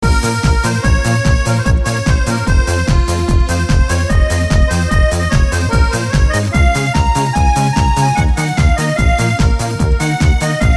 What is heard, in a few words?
Kalinka Dance